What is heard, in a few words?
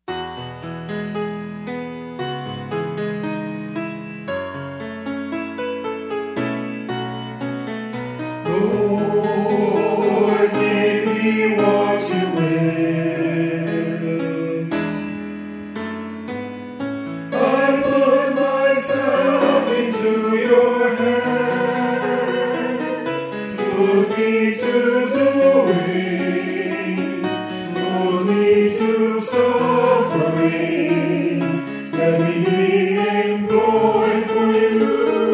Covenant Prayer SATB